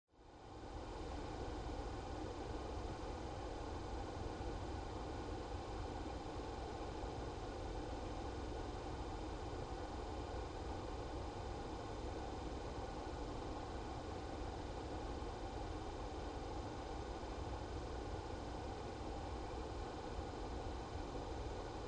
Car Interior AC Running Low Speed, Car Interior, 1996 Acura Integra, AC Or Heater Running At 01 Low Speed, No Engine; Transportation Automobiles